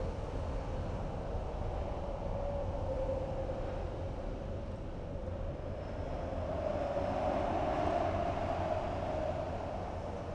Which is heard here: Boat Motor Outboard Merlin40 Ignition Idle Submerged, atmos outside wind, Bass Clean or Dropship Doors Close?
atmos outside wind